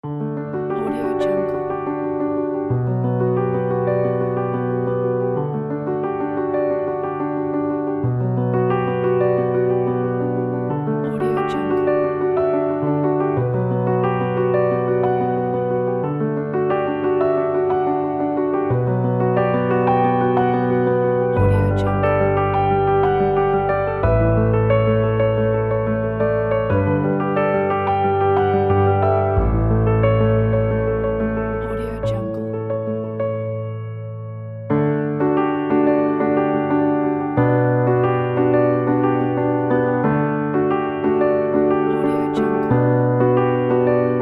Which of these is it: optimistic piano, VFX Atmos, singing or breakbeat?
optimistic piano